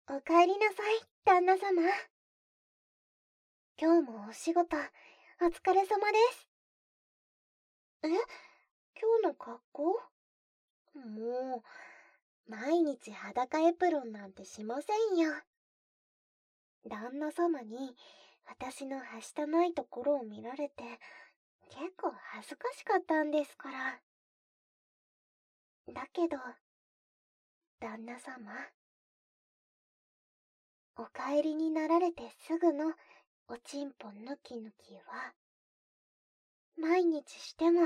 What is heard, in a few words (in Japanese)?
たっぷり耳舐め手コキ, ASMR; ＳＥ無し